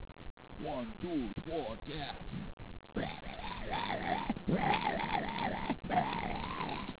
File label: scream